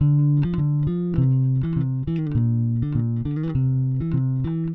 Jazz bass, Rock Bass

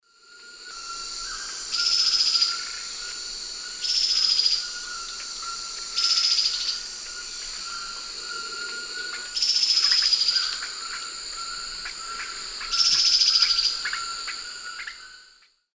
Hylophylax naevius, terra firme, call; S. America; Eastern Lowlands

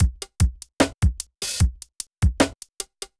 hiphop

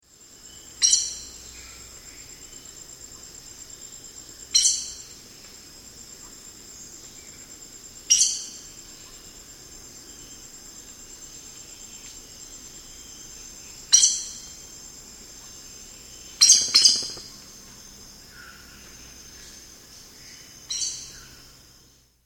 Collared Aracari; S. America

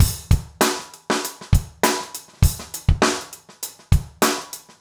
basic funk 100

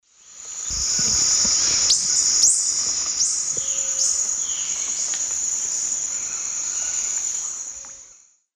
Phaethornis hispidus, flight calls; S. America; Eastern Lowlands

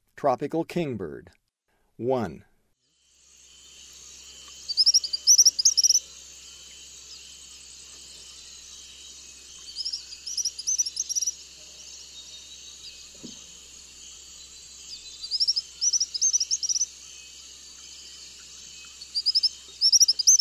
Tropical Kingbird; S. America; Eastern Lowlands

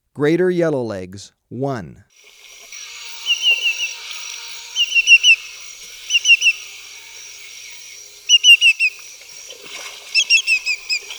Greater Yellowlegs; S. America

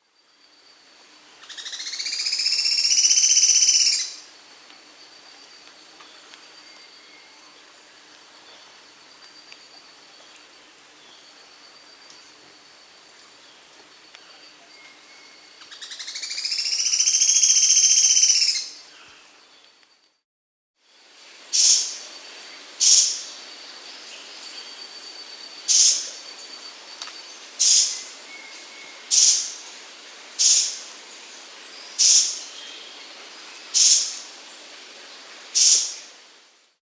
Sharp-Tailed Streamcreeper, Lochmias, S. America

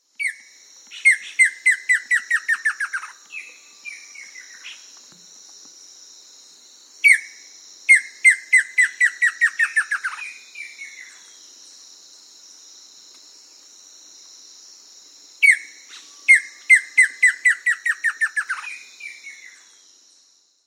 White-Lined Antbird; S. America

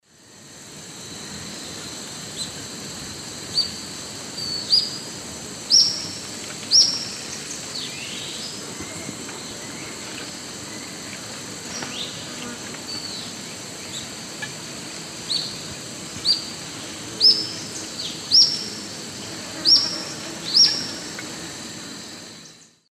Tolmomyias viridiceps, song; S. America; Eastern Lowlands

